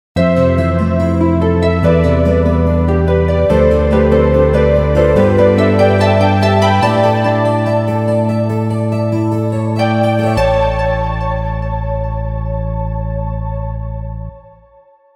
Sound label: jingle